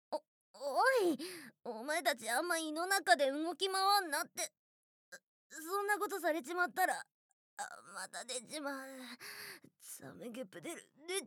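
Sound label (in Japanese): ザーメンゲップ（2連発！）; ザーメンゲップ集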